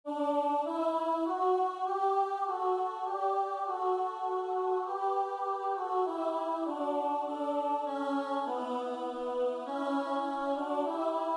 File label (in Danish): lyd Alt SAB; Dejlig er den himmelblå